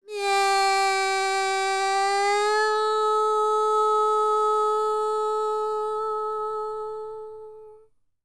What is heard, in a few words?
World Voices, INDIA; ILIO; Vocal Planet